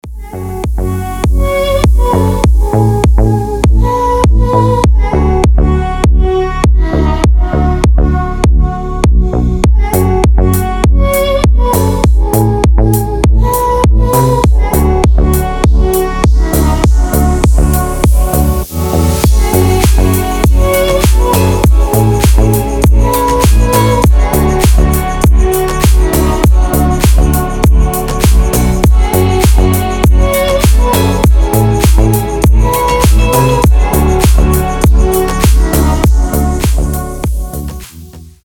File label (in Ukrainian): Красива музика для душі